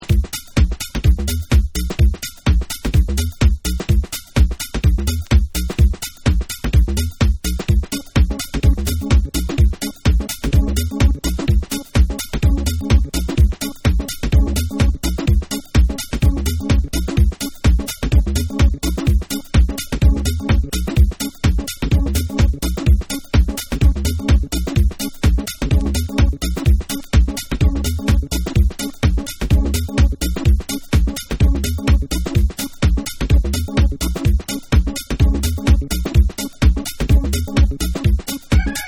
Solo Organ Mix

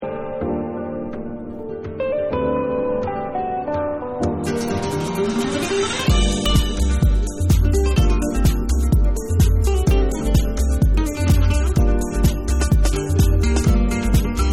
PERCUSSION ENSEMBLE

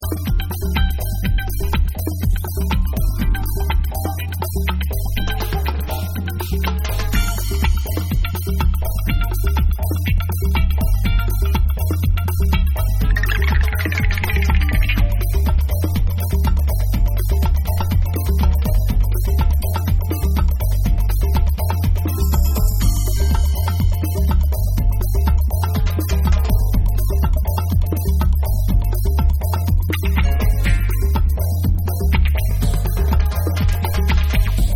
Organ Dubb

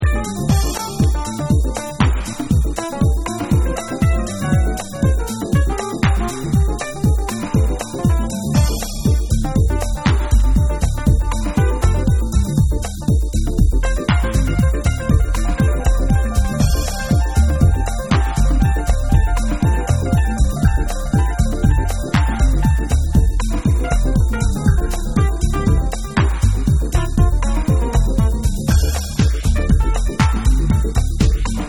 Deep Jazziness

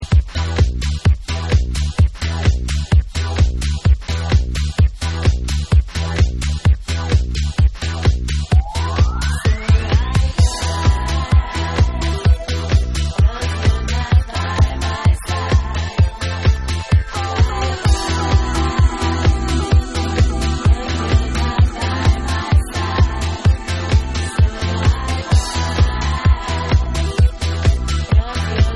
Dub

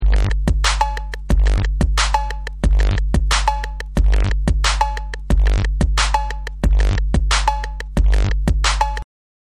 Breakbeat

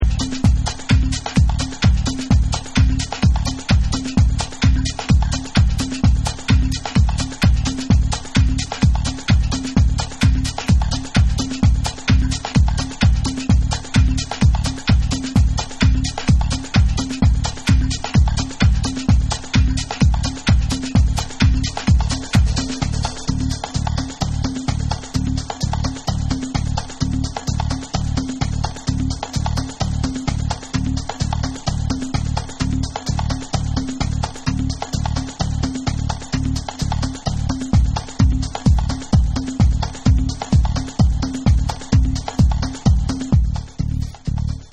A Great Tribal Beat

